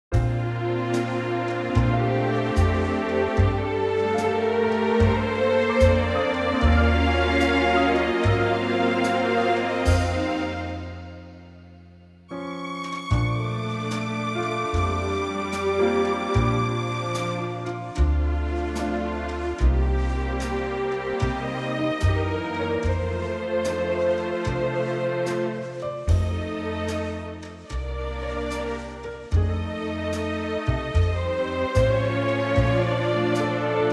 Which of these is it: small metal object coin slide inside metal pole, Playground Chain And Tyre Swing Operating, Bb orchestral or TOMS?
Bb orchestral